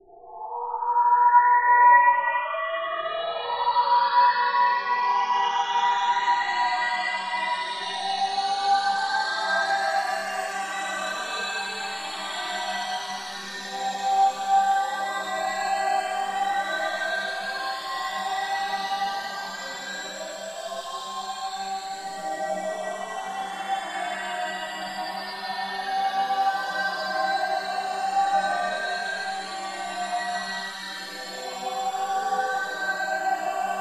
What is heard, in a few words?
rope spectral shimmer; Women's Song with Spectral Shimmer; Michael Norris